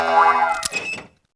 Unlock level; LittleBigPlanet 2; PS3

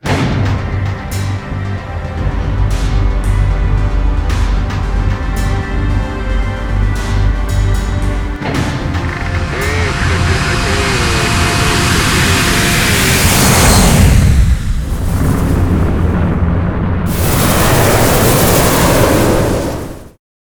takeoff; Rolling Gunner

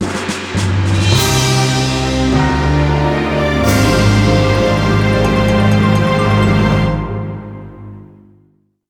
gameover; metal slug 6; ps2

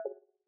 spawn close; teardown; ps5 windows xbox series xs

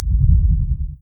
Attack Move Right

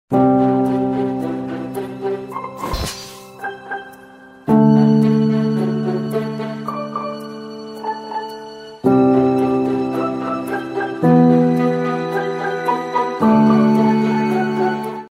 Cinematic Music

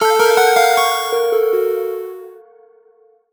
Ticket Collect Jingle; curse crackers for whom the belle toils switch windows gamerip 2022

